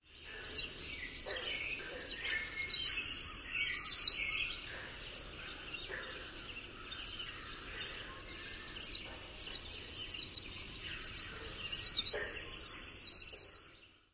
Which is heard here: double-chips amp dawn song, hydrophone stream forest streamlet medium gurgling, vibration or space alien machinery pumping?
double-chips amp dawn song